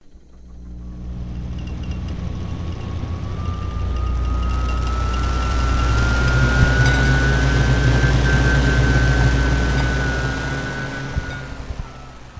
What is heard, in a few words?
Space Ship Take Off; Michaelis